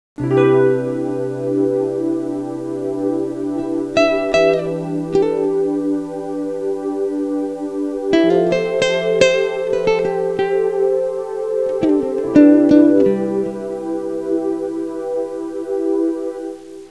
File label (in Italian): suonerie musica jazz